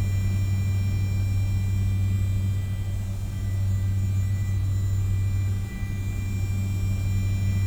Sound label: Neon Hum; zps; Ambient; Hums